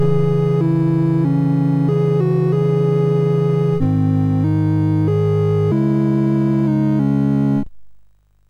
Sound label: MOS6581R3, GAMES; Woodpecker